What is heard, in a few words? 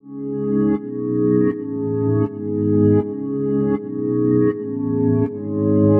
Keys Reverse Vibe Chords C#m 160; Melody; Keyboards